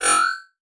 Multimedia Internet CD-Rom Flash Wipe Electronic

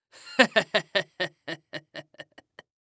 Horror Scary Human Male Laugh Evil Villain Laugh

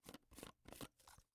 Technology Plastic Flash Light Top Unscrew

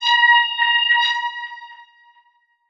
Transition Musical Tone Warble; Production Element; Title Transition; AdobeCC

